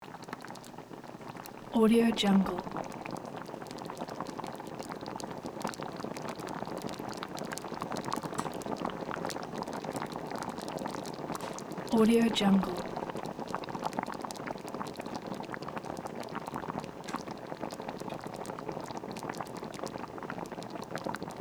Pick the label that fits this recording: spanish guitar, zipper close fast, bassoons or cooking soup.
cooking soup